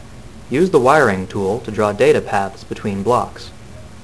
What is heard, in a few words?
Wire; LabVIEW 2